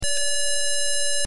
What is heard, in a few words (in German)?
musik